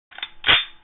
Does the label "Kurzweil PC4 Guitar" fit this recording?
No